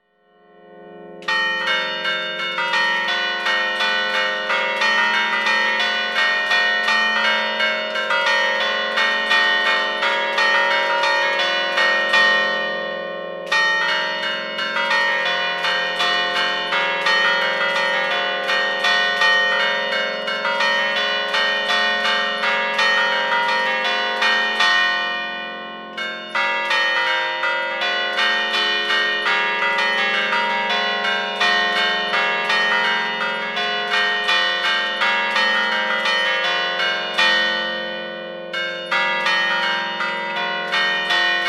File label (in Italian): campane, marcia per cinque campane; Giampietro Migliorini, di Bergamo; appresa da Agostino Casari (1901 - 1978) campanaro